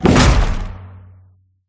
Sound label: socket; sfgame